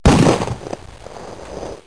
guns

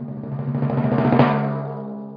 win; Pong

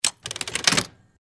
Spin Button, Island Slots

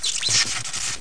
SPARK

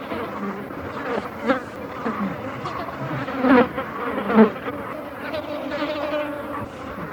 Flies Loop

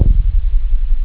deep, synth bass

